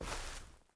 Footsteps Grass Walk; Contrast

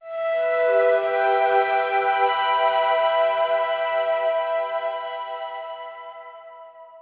Synth Emin

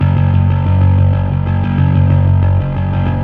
Fuzz F sharp 2

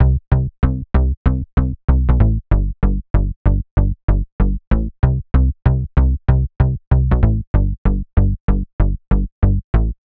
Bass; vostok inc. switch gamerip 2017

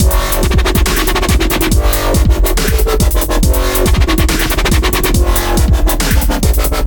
Lose music

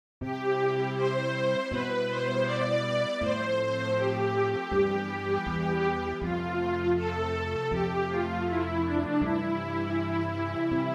MIDI Town

